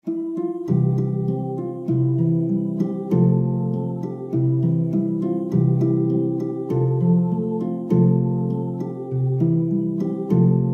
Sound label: harp